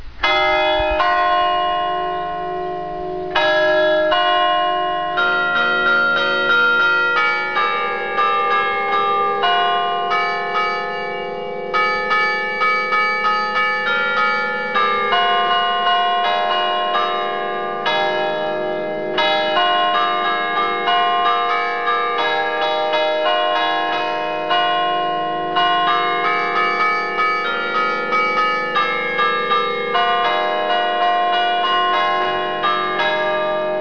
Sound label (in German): glocken